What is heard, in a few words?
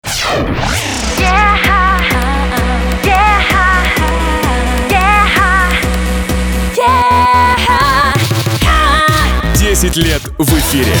radio jingle